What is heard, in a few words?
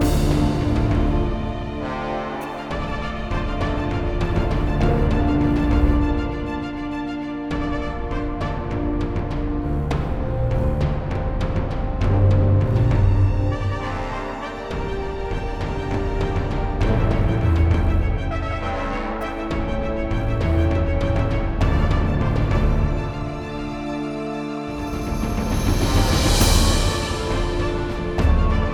Epic video game fight